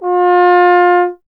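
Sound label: bass trombone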